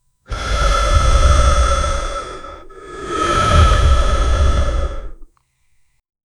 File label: multiple very deep heavy, gasmask